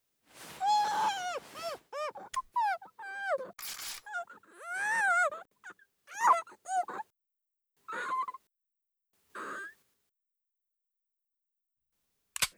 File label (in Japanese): ルーレット 外れ